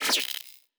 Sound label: Device Stop, Electric